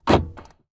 Transportation Jeep Commander Door Unlock Interior